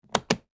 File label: briefcase lock